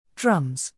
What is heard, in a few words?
drums